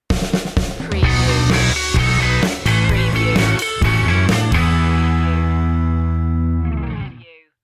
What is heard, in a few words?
rock sitcom ident